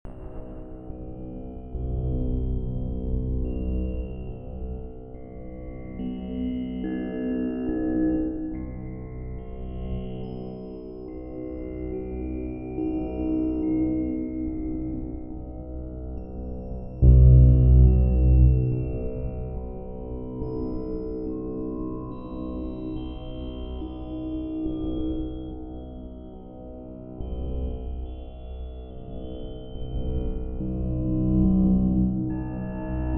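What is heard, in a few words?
Space Melody Sci Fi Engine, feedback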